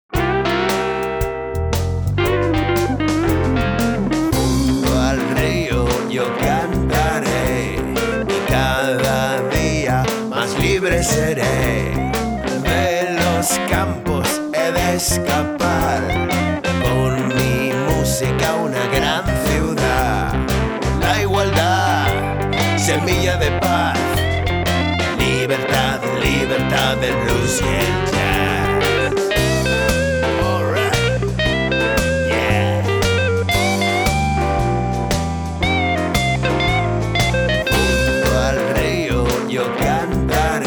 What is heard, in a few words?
Ragtime